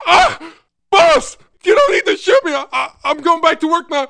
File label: GET SHOT; Messiah; WELDER